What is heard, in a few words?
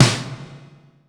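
REV SNARE; Greytsounds; Scott Peers Sound Engineering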